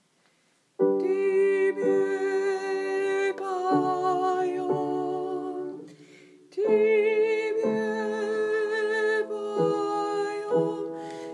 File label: alto